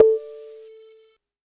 Perc Afro Casio SA-1

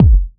Kick, Kickdrum; Equipped Music; Loungin House

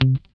GUI C3, E-Gui Muted; Sound & Vision; Gigapack I CD 2

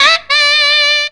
FUNKY SAX; Zero-G; Funky Elements

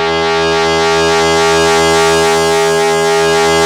2600 C#3, ARP 2600; Hollywood Edge - Giorgio Moroder Rare Synthesizer Collection

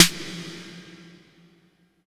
808 SN; East West - Phat & Phunky CD2; 90's Sample CDs

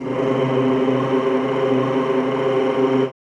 CHO Rrrr

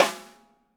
DW Green Maple Timbali Snare; Sonic Foundry (Sony Creative Software); Vital Drums

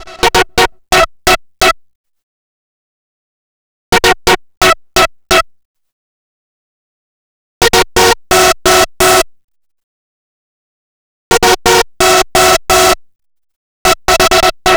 Trance Chord; Sonic Foundry (Sony Creative Software); Afterhours EDM

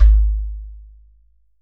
GHATAM

